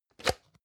plastic can small lid open hard

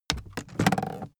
aluminium case handle bounce

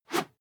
whoosh whistle fast

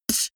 beatbox snare damped

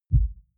sub bass

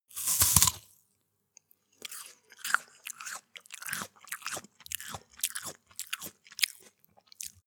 apple sequence

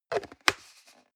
plastic can small lid screw single close

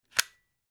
plug unplug single

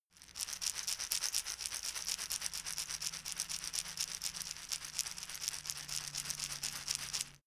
shaker wood rattle slow soft

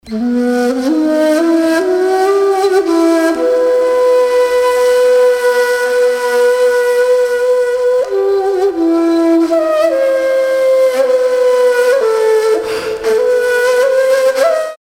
oriental flute

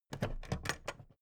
plastic clasp open heavy

